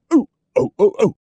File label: Man Dance Happy; Zomboid; Lifestyle